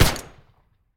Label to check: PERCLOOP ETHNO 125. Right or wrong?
Wrong